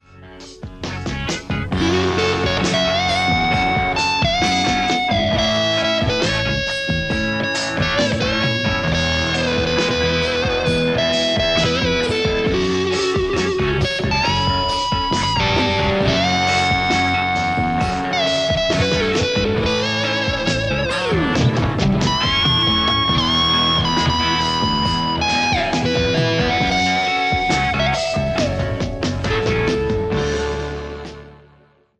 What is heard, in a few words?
lespaul thunderverb200